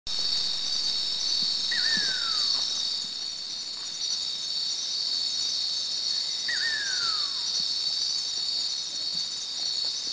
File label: Great-eared Nightjar Subic; Great Eared-Nightjar Eurostopodus macrotis; 1 at Subic